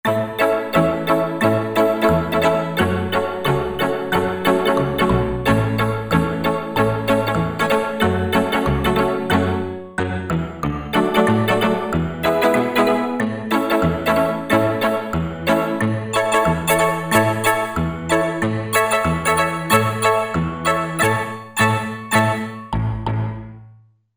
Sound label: 2 voices; Korg MSC-12S Synth 3